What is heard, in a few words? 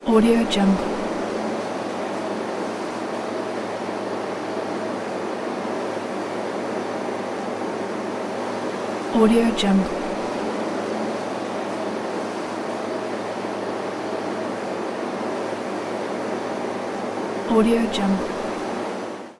air conditioner